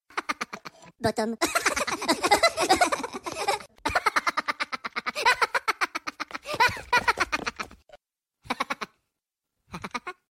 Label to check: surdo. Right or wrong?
Wrong